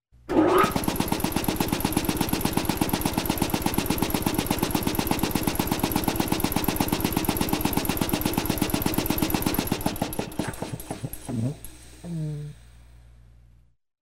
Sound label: traffic